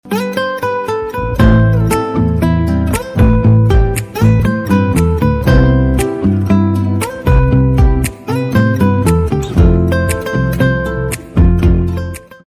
lofi instrumental ringtone instrumental love

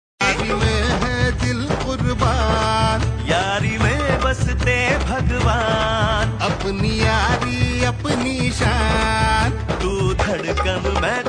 Marathi Ringtone; yari dosti ringtone

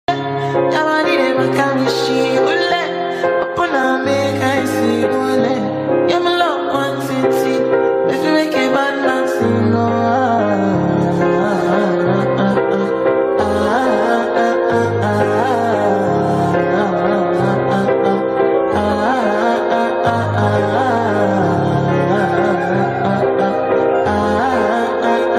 english bgm ringtone

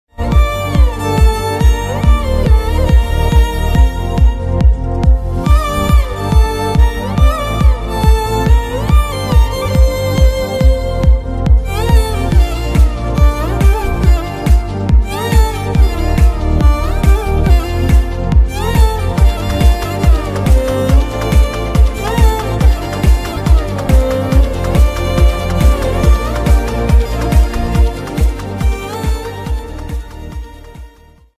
song bgm ringtone trailer bgm remix ringtone; amaran

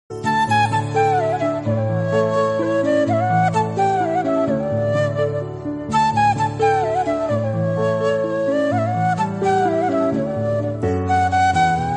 sad bgm; kilukkam

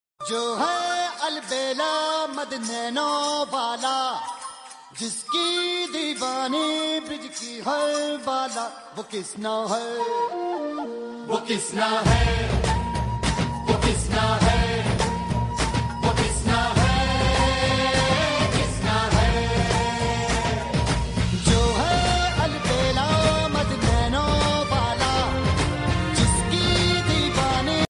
krishna flute ringtone

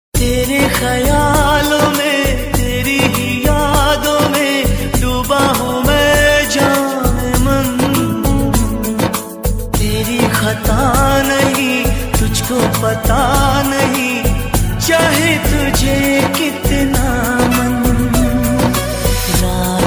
love story ringtone best romantic hindi ringtone

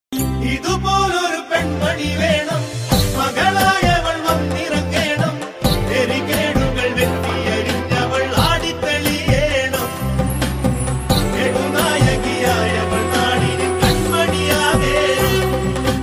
malayalam ringtone

